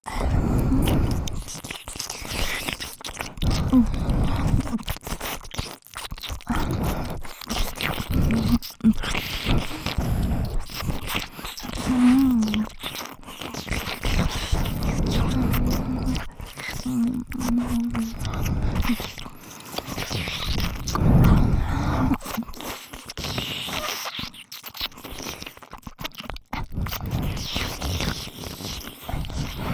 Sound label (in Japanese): 両耳 舐め; エミィ; 爆乳Wお姫様; 異世界救ってお姫様とご褒美孕ませエッチ♪